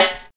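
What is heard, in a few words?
SN, snare; X-Static Goldmine 1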